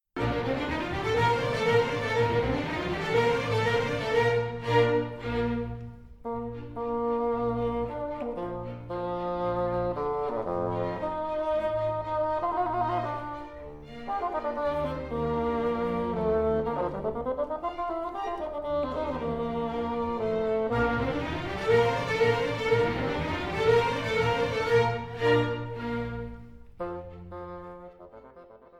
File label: Fagotto Con Bf